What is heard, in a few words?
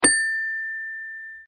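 xylophone; Toy Story 3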